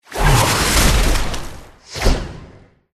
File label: attack power card water